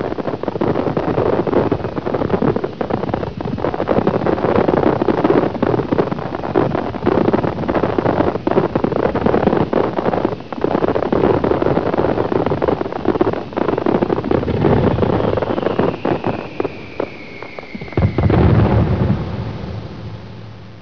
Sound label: firesound